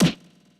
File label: Moderate Hit; Super Smash Bros